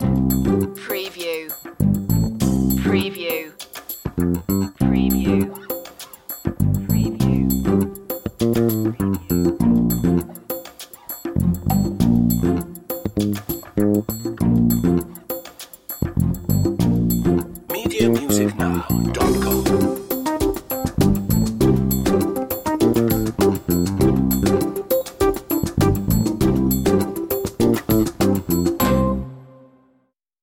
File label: beat